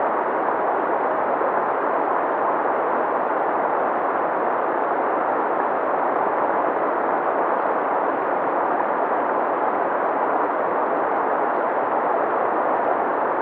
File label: ambience pipe flow